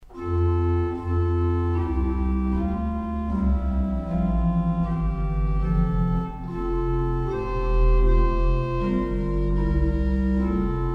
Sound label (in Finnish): gamba soolo; urkutieto